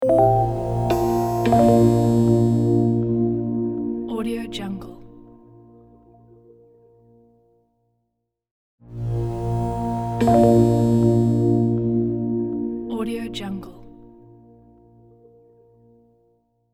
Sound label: minimal logo; audiook